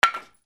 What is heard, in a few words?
FALLING